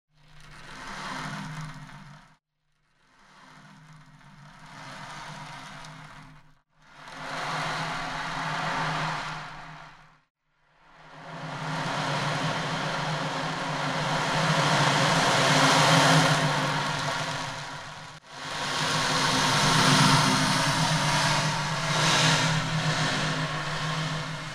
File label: ocean drum unfiltered